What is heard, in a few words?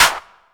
electro clap